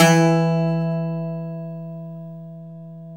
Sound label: BOUZ PIFO E 1